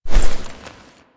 Foley Kneel To Stand; Ambience; Quest Drifter; Duviri; Warframe

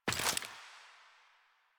Steps; Arthur; Warframe; Ambience; Nineteen Ninety Nine

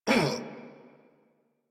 Pain; Warframe; Veilbreaker; Kahl Barks

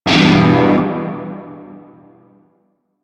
Shawzin Maj Chord C; Music; Warframe; Narmer Shawzin

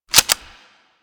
Release Gun Foley; Warframe; Tutorial

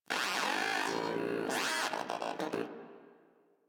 Moa Aggressive Vocal Upgrade Bored, Pets; Warframe

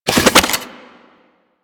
Fist Spin; DE; Warframe; Weapons; Archwing; Melee; Grn Arch Regor Fists

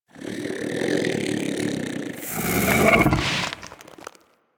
Bug Vocal Snore; Warframe; Hunting Critters; Inf K Drive Bug

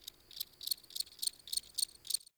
Insect Day; Warframe; Ambience; Eidolon Plains; Eidolon Landscape; One Offs; Insects

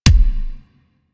Pistol Punch Alt, weapons; Warframe; Thanotech

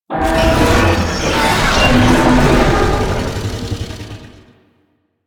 Tank Infested Vocal Idle; Enemies; Barks; Warframe; Lasrian

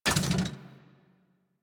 Mech Step Arm Up; Nechro

